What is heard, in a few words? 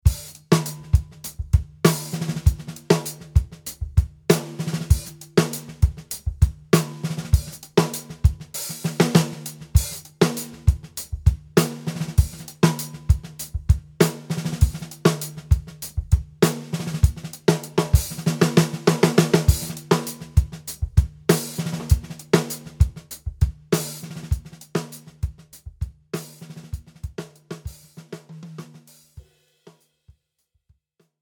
Pro G3 snare; Grover